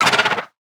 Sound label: damage; HAMMERHEAD